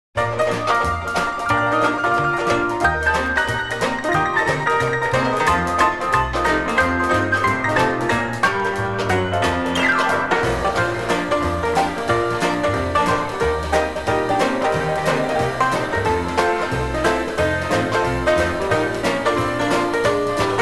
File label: Rinky-tink piano with band and banjo